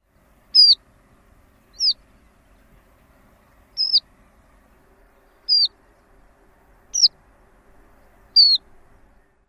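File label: Horned Lark, calls; NL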